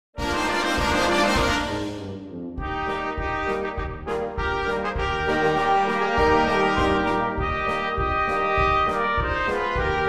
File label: Polka